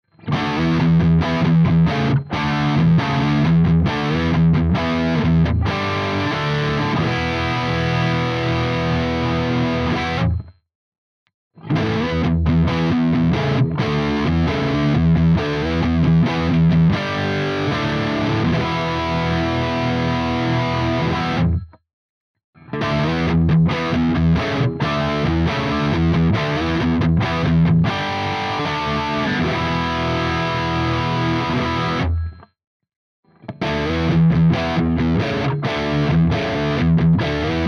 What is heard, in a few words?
rhythm Riff